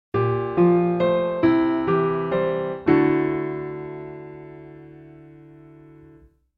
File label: jingle